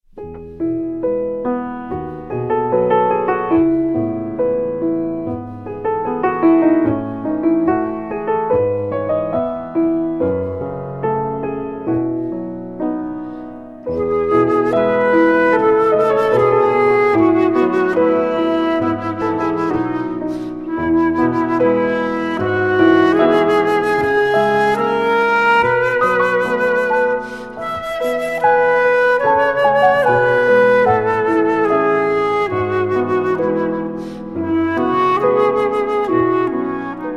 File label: Piano And Flute; string quartet; diSogno String Quartet Ensemble